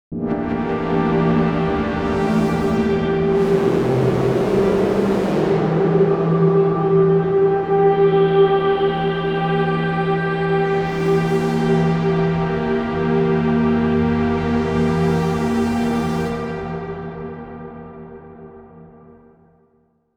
plant chosen seed wheel; sonic charge; synplant

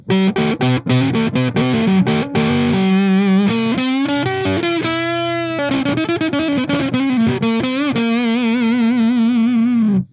muddy, guitar; native instruments; guitar rig

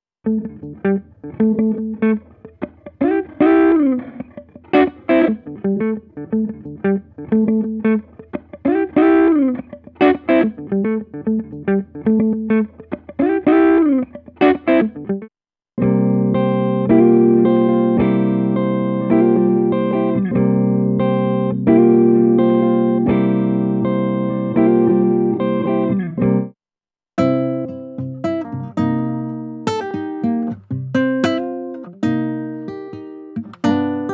mpc5000 stretched guitar; akai

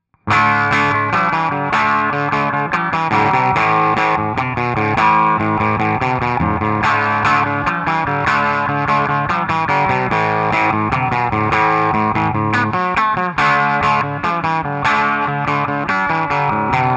crunch rock bridge; En crunch, le micro chevalet sort toute sa puissance en délivrant un niveau de sortie qui rend encore plus moelleux le son; indie totalnatural

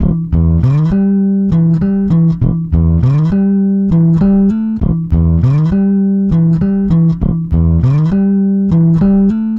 bass; celemony melodyne

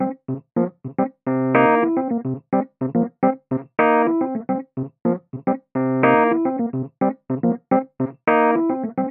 riff rhodes; eastwest colossus

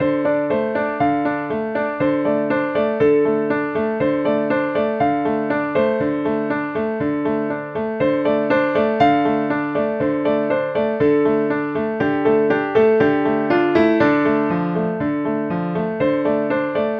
the grand model 1 no ambiance, piano; native instruments akoustik piano

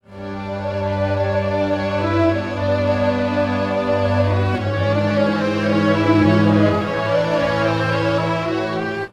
5, strings, pitchshift; bias peak

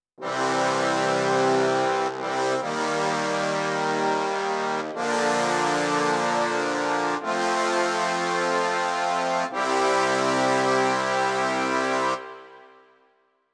tromb sect sample; Clavia Nord Wave

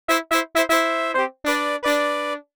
section salsa, brass; arturia

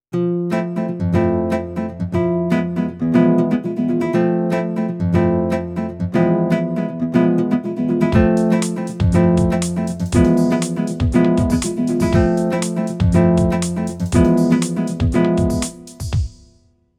M50 son ac guitar; korg

